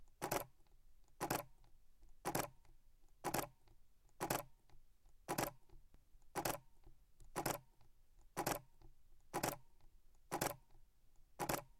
Canon EOS 7D Mark II Silent

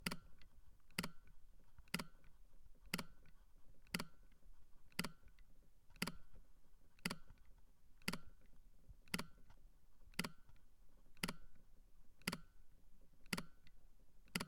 Sony a1

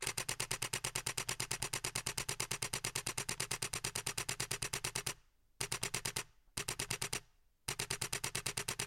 Nikon Z 6 Burst Extended

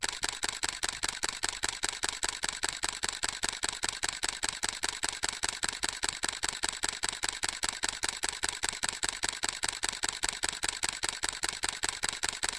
Canon EOS Rebel SL3 Continuous

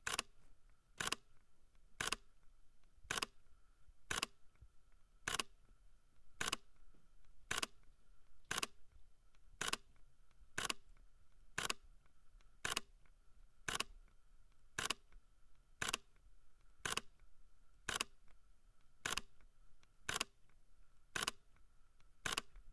Sony a7R IV

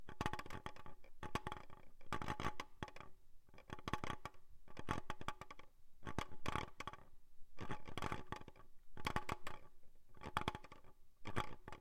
Canon EF 600mm f 4L IS III USM Lens Rattle

